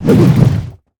Barrel Toss Cast

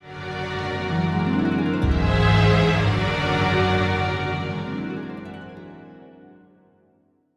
Play Stinger; Malygos

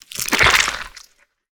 Enter Play

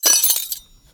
Fork Underlay Death

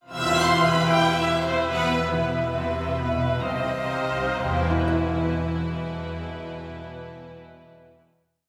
Play Stinger

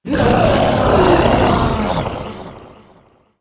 Giant Death

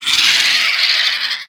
Gilded Gargoyle Death

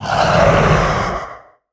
Demon Attack

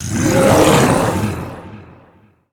Giant Attack

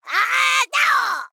Attack; Fungal Enchanter

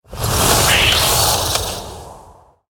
Iceshard Play

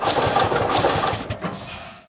Snow Chugger, Play Underlay

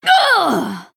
Female Human Death

